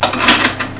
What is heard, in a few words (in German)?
ritsch